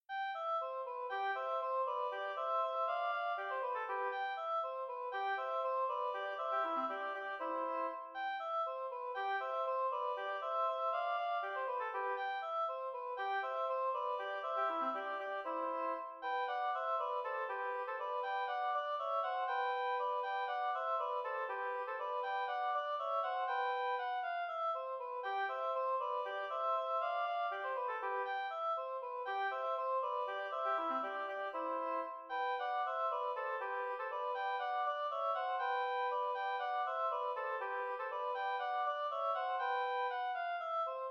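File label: oboe duet; duo no.2; anton heberle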